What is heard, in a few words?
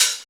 HH, Dance Mix